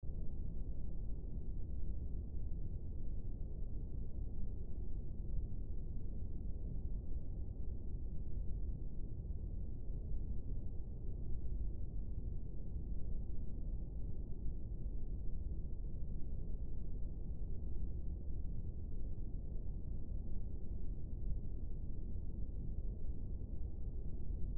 Darkroom Ambience

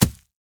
impact object drop on dirt grass

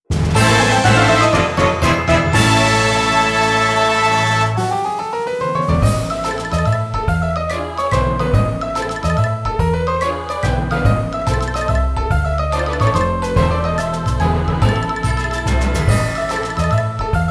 Battle Victory

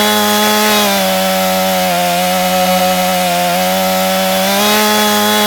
Chainsaw Cutting; xbox 360; gamerip; castleminer z

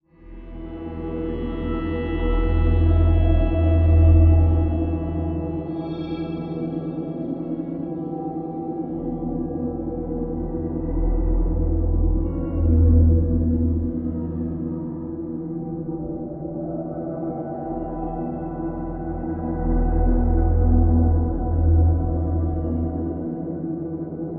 Rough Awakening Ambiant; edge of eternity

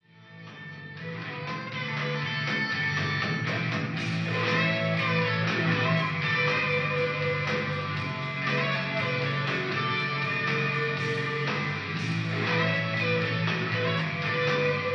Rock

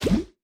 Mobile Menu Planet Paint

